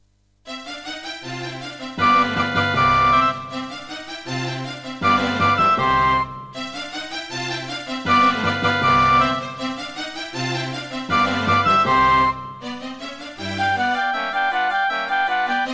Mozart - Concerto K467 partial, Turtle Beach Cancun FX Clone; Serdaco Yucatan FX; classical